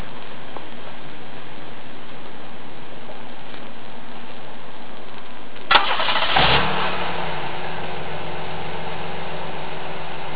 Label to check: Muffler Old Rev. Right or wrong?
Right